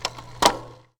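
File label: Changing Gears, bicycle; Cervelo P2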